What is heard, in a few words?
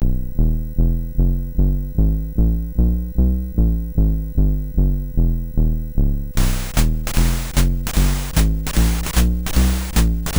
Jam, MOS6581R2